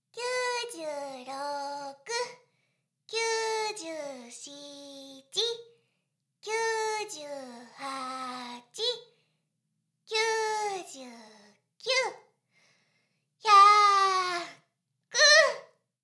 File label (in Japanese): シコシコ音声, ASMR; ダメなメイド